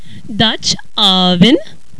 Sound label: dutch oven